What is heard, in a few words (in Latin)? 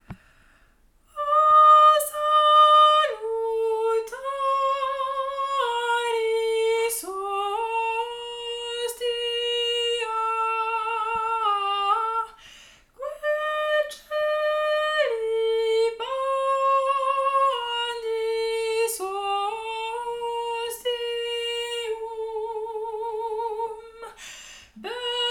O salutaris tenor